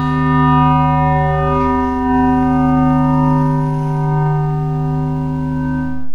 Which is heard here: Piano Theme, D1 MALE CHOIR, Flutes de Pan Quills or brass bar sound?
brass bar sound